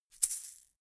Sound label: shaker plastic egg hit double hard